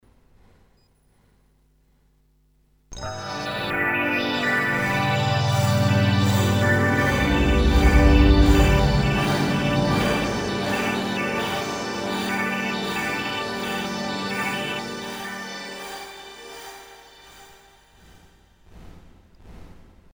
ASR 10 Transwaves mixed

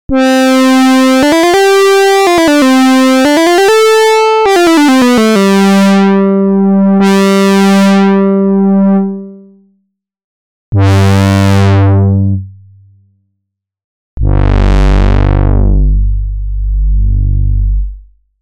PWM BRASS Arturia Modular V3

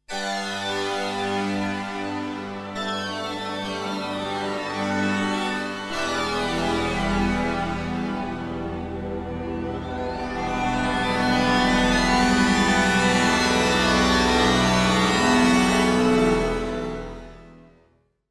ASR 10 Transwaves indigo

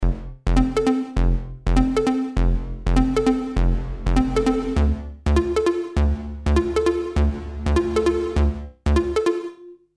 ENSONIQ Lush Plate Decay

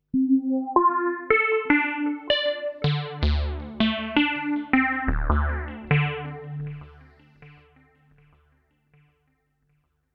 Resonant Filter; WAVeBOY Industries

